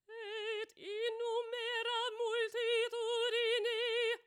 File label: choir